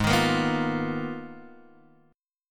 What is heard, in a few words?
Ab Minor 6th Add 9th, Ab, Guitar, Open G